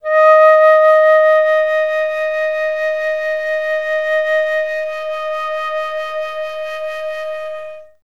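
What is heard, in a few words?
flutes D#4; Best Service; Peter Siedlaczek's Advanced Orchestra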